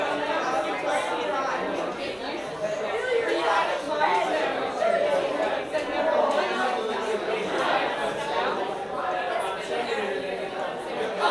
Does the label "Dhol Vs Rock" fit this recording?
No